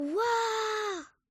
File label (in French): Chauve Souris Waaw; mirumiru